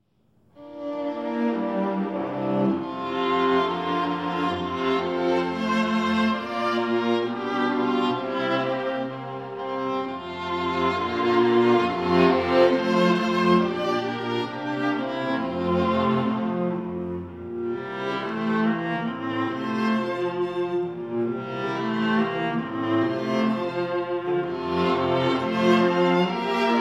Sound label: String Quartet; Friendly Giant; Winnipeg Canada